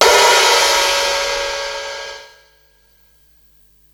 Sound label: Hihat open, Psychedelic Drums